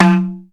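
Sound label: drum, Ethnic Perc; Dance Synths; Percussion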